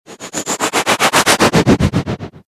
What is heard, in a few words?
move fluttered noisy